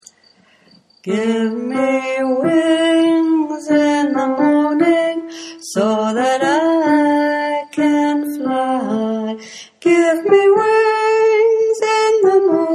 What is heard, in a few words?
Tune